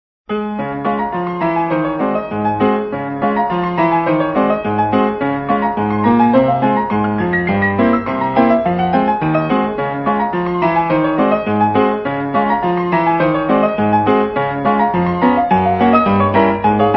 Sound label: Piano Roll - Frog Legs Rag